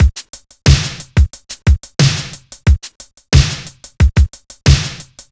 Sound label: 80s Beat 90 bpm, Drums; Loops; Ableton Live 12 Lite; Core Library